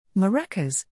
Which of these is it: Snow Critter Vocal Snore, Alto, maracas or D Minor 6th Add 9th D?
maracas